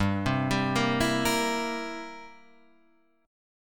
C 7th G

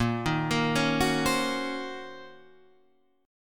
Eb Minor 13th Bb

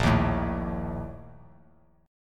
A sharp Major 7th Suspended 4th; strummed

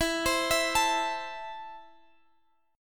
E Suspended 4th Sharp 5th, Guitar Standard; Esus4#5; arpeggiated; x,19,22,21,22,x; down; capo=3

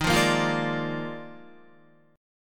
Eb Major 7th Suspended 2nd Suspended 4th, Guitar; strummed; 11,8,8,10,8,9; EbM7sus2sus4

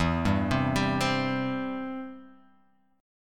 E-Minor Sharp 5th; x,7,5,5,7,7,x,x

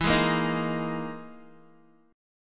E Major 7th Flat 5th down Guitar; EM7b5 strummed; JGuitar

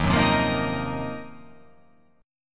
D sharp Minor 6th Add 9th D sharp, chord

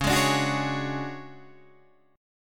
D Major 7th Flat 5th, chord

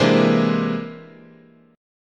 C Augmented 9th, chord

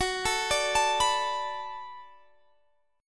B Minor 7th Gb, chord

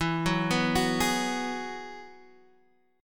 Ab Minor 7th Sharp 5th

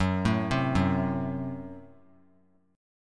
Gb Diminished; arpeggiated; JGuitar

